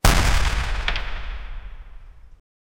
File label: a powerful double barrel, shotgun